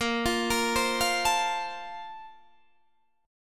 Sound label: Bb Major 7th Suspended 2nd, Guitar, Standard; 18,20,20,17,18,17; arpeggiated